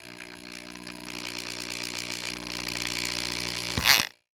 Industry Tool Drill Power Drill Auto Drill Bit Tighten